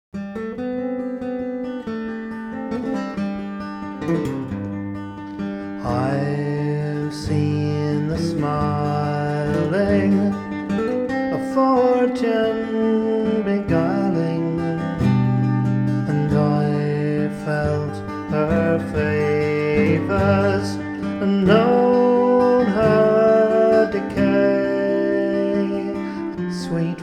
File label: Flowers of the Forest, sung